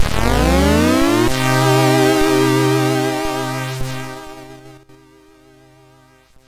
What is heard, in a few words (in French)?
Musique, sid MOS6581R2